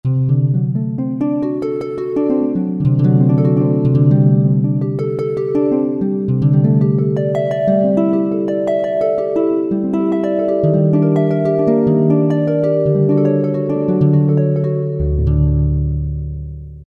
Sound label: Harp Pluck; Factory Preset examples